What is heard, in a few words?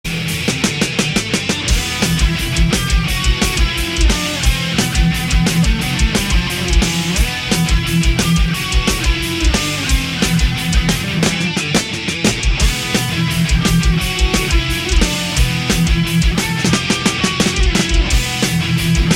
solo